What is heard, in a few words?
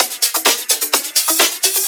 Discohouse Loop 128 BPM